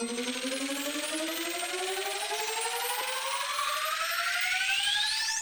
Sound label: ladder End Count Up; netent